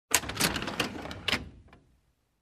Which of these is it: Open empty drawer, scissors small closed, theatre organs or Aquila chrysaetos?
Open empty drawer